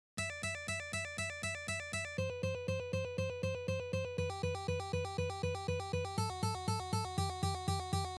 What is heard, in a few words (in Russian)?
Vertushki na gitare; taby